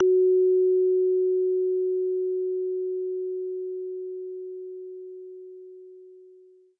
bell instrument note; Multisampled Bell Instrument; WORLD

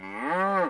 moo, Cow; Cow Construction Kit

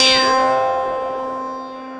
Synthesizer Middle C Notes, Lead

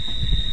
subway sound; Subway Sound Construction Kit

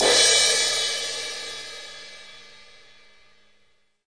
Crash, Real Kit; REAL LIVE KITS

